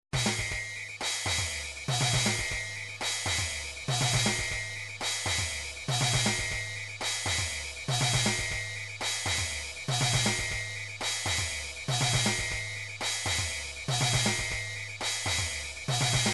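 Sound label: drums pattern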